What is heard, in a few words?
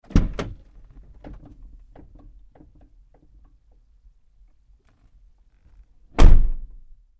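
Truck GMC Savana Door Interior Open Close Med; 2010 GMC Savana; Trucks; BigRoomSound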